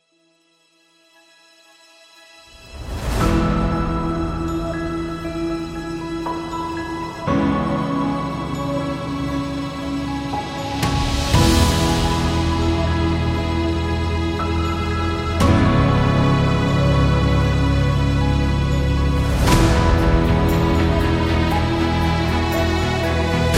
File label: Inspiring Speech